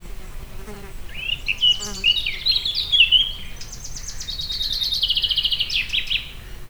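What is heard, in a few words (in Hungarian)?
sziget madár, bagoly; termeszetben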